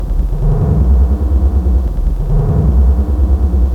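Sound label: analog bass background endless F minor; loops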